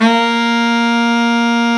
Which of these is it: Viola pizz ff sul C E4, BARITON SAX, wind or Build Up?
BARITON SAX